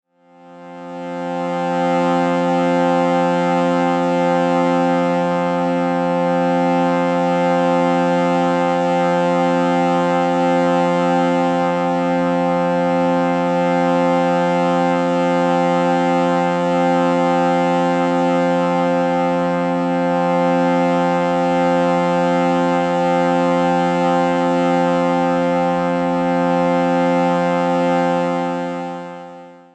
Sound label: Shruti Box F C 440Hz